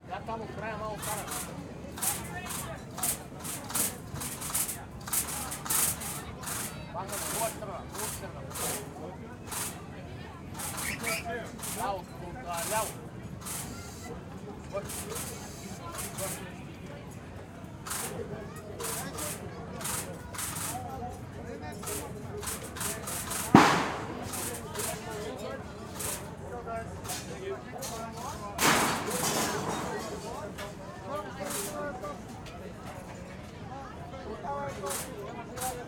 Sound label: Game, Fair, Shooting Gallery, Air Gun, Plate Break, Voices - some faint background machiner; some faint background machinery, background fair ambience; Airborne Sound; Fairgrounds; CNE